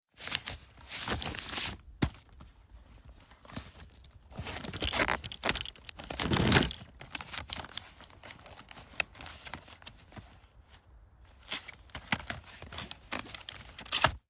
golf bag with clubs moving

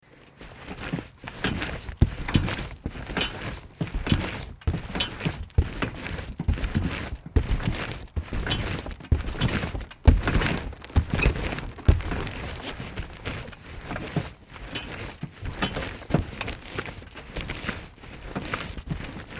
trampoline jumps

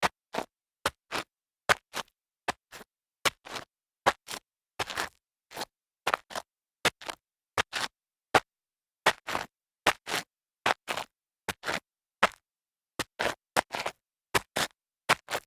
sneaker toe slides rough grit, foley

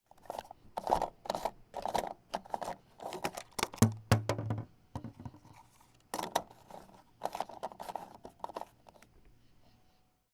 remove gas cap

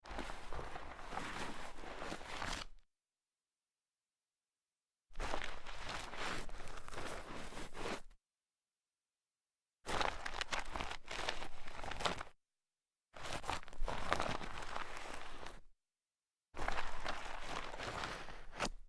sneaker long foot slides dirt

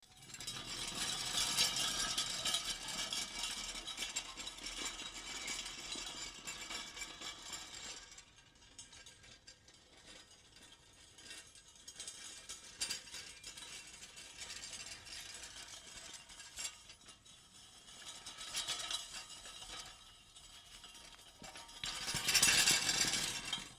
metal shuffling

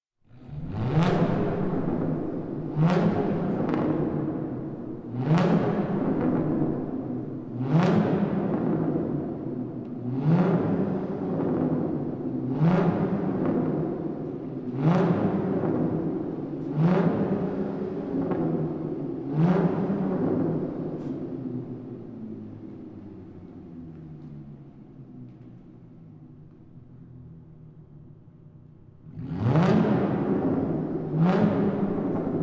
engine revving in parking garage

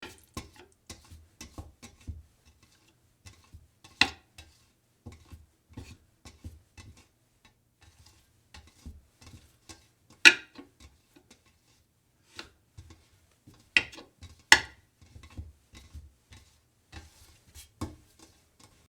wiping out sink with hand

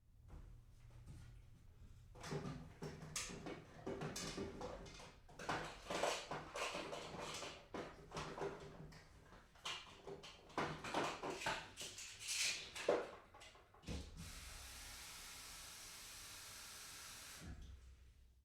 pill bottles in cabinet

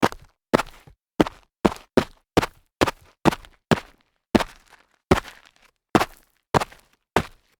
sneaker large jumps light grit

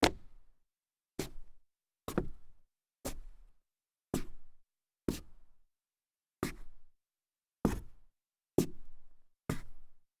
shoe scuff solid wood

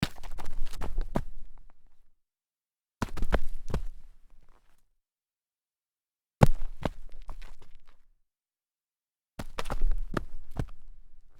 sneaker stumble side dirt

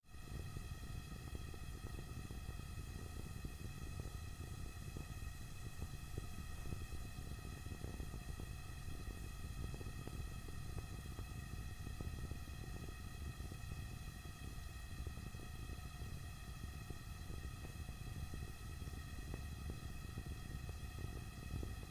gas lamp flickering

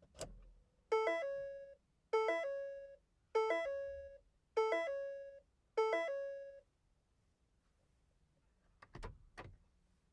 open car beeps

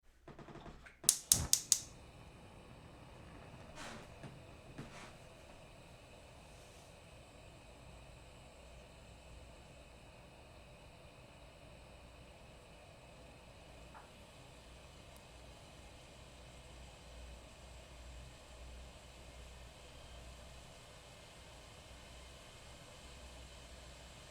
kettle boil

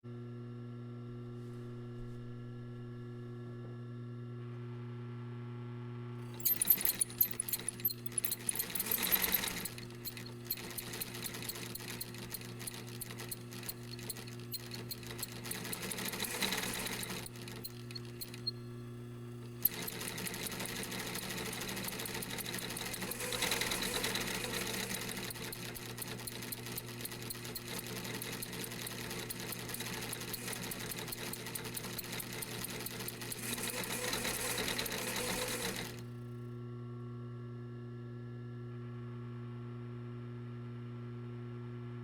industrial sewing machine

